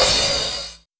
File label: Ride Fast